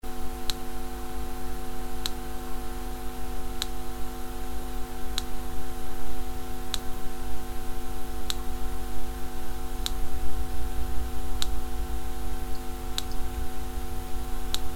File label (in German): kontaktmikro klickgeraeusch; kontaktmikrofon; stoergerausch